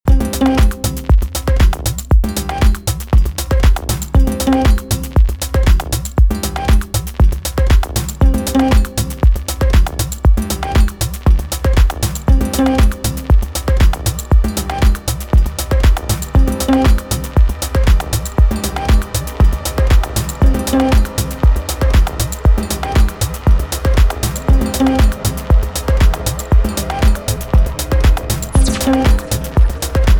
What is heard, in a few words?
Batucada